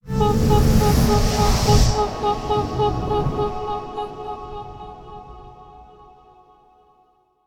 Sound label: Freeze Spell; Snow Queen